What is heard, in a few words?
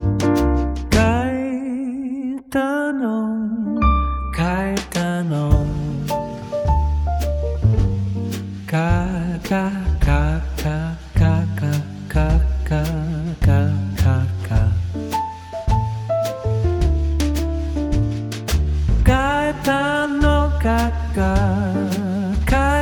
jazz